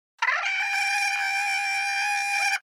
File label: Long scream; Ep8; Porgs